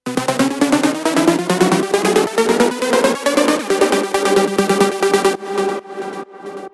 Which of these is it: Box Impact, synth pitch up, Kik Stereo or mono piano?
synth pitch up